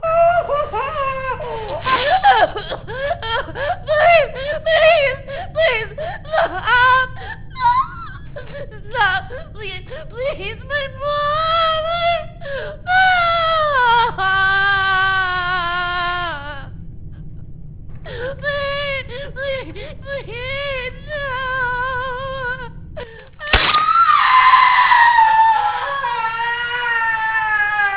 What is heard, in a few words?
arghhhhh